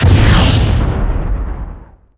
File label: explode